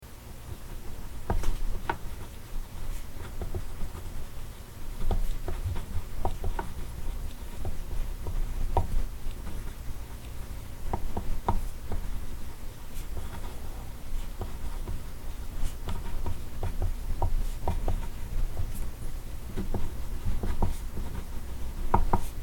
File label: pencil scratchings